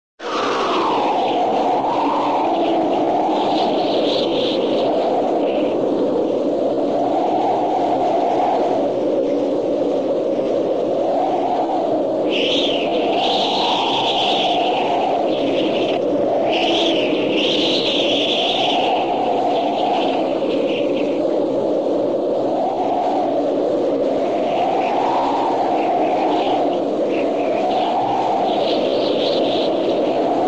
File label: wind howling, whistling wind, weather; cartoon